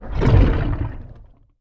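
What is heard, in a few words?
howler monkey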